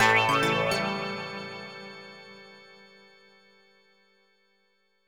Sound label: Pogo Stick Jingle; Strawberry Shortcake The Four Season Cake